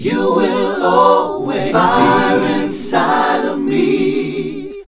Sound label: backing vocals